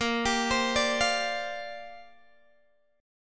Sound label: A sharp 6th Add 9th, Guitar, Open G